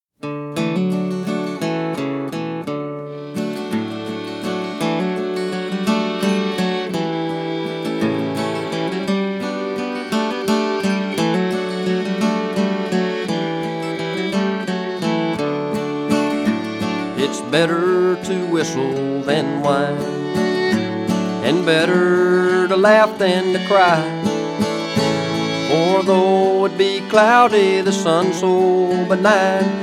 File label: whistling song